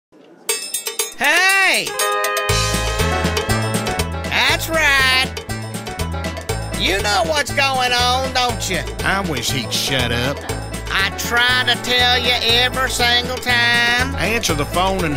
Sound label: Country Hillbilly